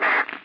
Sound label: radio click; War Thunder